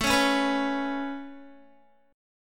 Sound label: A Major Add 9th A Guitar, chord; Aadd9 strummed